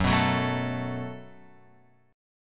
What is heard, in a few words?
F sharp Minor Double Flat 5th F sharp; F#mbb5 Chord {2 2 4 2 X 5} strummed